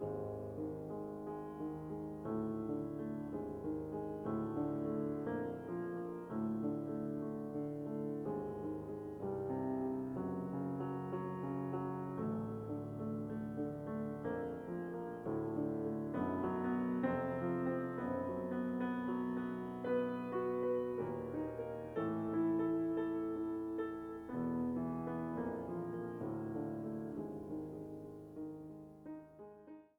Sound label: motus, Piano